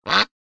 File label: duck anechoic; reverb demo